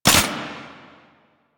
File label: Archgun Low Clip; Warframe; Archwing; Weapons